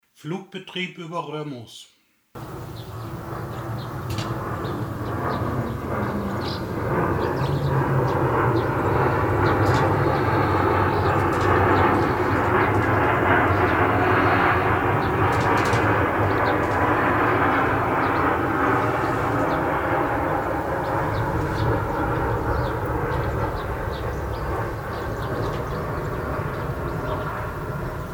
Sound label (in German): So laut sind Windraeder